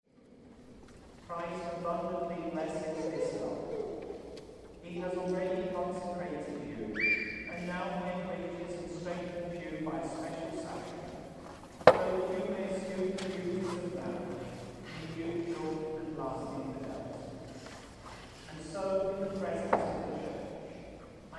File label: wedding service plus 8 month old baby cooing